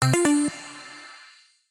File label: sys Attention